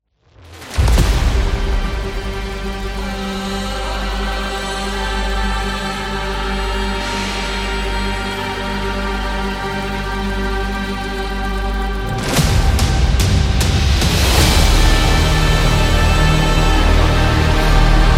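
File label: Arabia, epic cinematic trailer music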